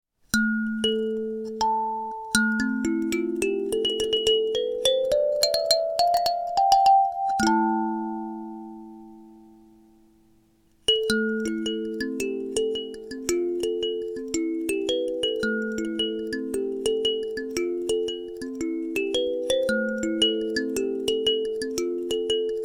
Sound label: Karimba A minor